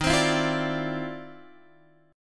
D-Minor 9th, Eb, Guitar, Standard; x,6,10,9,6,10; Dm9/Eb strummed

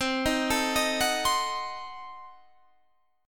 C Diminished 7th C down Guitar Standard; arpeggiated; JGuitar

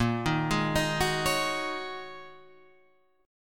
Ab 7th Suspended 2nd Suspended 4th, chord

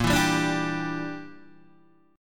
A sharp 6th Flat 5th A sharp, Guitar DADGAD; down

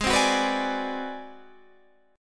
Ab 7th Sharp 9th Flat 5th Guitar Standard, chord; Ab7#9b5 strummed; JGuitar

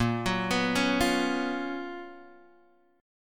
F sharp Major 11th A sharp

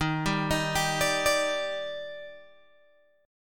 Eb Major 7th, Guitar, Open G

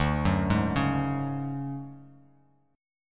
D Major 7th Flat 5th D down Bass Standard, chord; arpeggiated; JGuitar

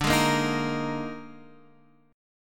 D sharp Major 7th Suspended 4th, D

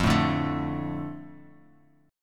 F 6th, Guitar, Open F; strummed; chord; 5,4,2,4,x,x; JGuitar

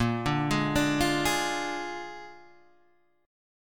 A sharp 7th A sharp, Guitar, Open G

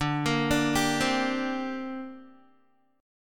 D 7th Suspended 4th Sharp 5th down Guitar; D7sus4#5; arpeggiated

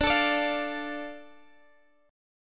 D Minor D Guitar Open G

